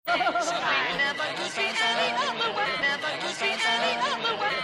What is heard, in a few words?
Inner Groove